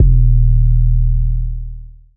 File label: trap bass; multisampled; 7rystan Trap Bass